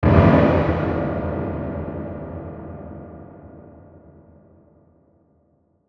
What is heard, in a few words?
Hit Hollow Reverb, Hit Hollow Reverb Tail; Blastwave